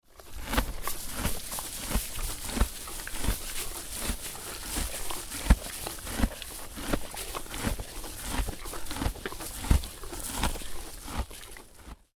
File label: Horse Eating Munching Hay, Horse chewing dry grass; Horses; Animals